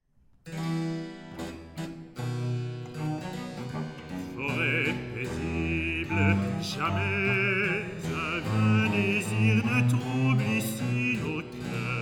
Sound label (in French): refrain basse bc; baroque; forets paisibles; Les Indes galantes